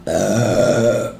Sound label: burp; nani heichou